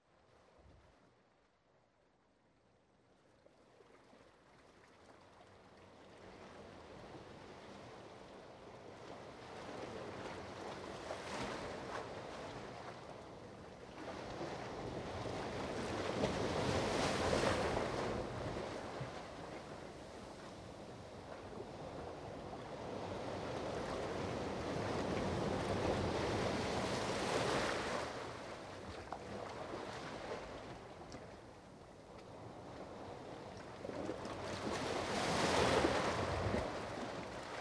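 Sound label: Sounds, Waves and rocks; Volny i skaly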